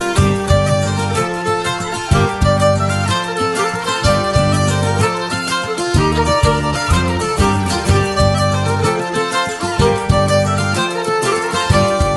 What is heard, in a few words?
galaxy a70s ringtone